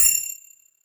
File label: coin